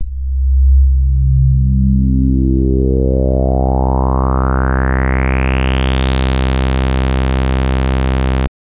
CHRACTER LIQUID; Redshift 6

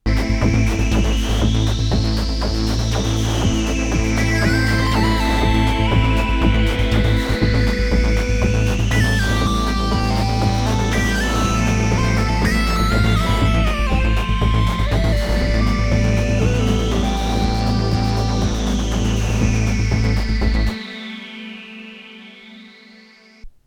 Multitimbral PHASE; Redshift 6

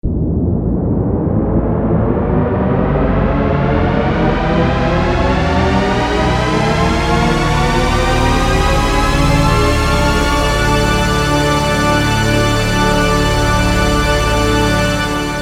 Cinematic Swarm, synthesizers; Redshift 6

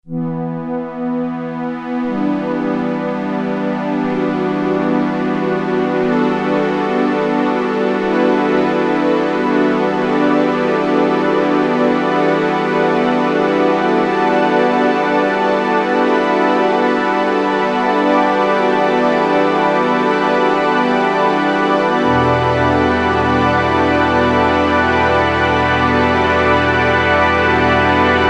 12 NOTE STEREO PARAPAD; Redshift 6

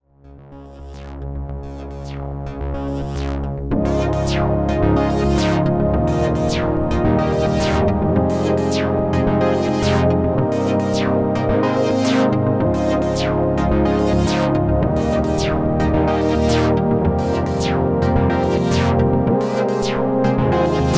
Multitimbral, synthesizers; LUNAR CLOCKWORK; Redshift 6; supercritical synthesizers

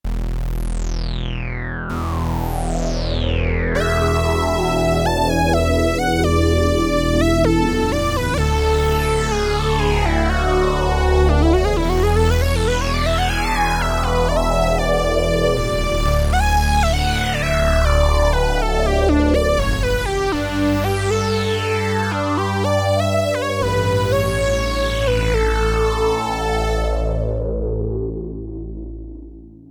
Multitimbral TOM SOURER SPLIT, synthesizers; Redshift 6; supercritical synthesizers

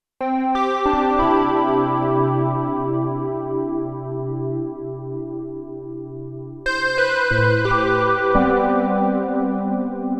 RS6 FX stage reverb new tines; Redshift 6

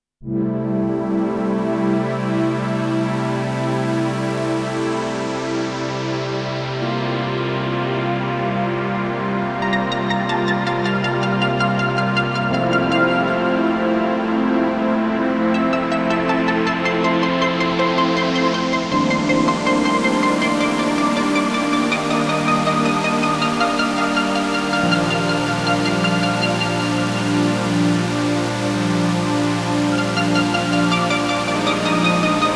Arp Pacific Raindrop; Redshift 6

